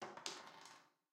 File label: dice; beat; golden tavern